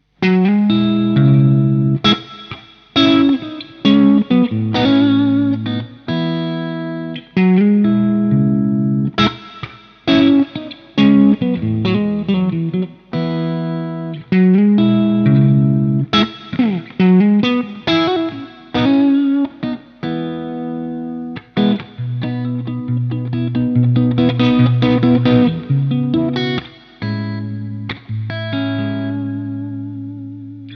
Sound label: LOW plus NORMAL; GRAVITY SPOTOCEAN